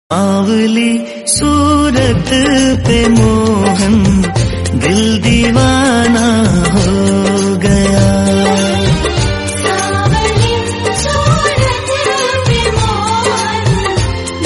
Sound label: SONG RINGTONE; KRISHNA BHAGWAN